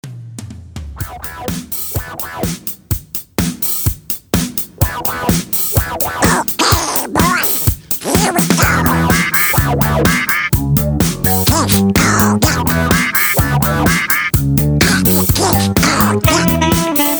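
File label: Hoedown Patter with background vocals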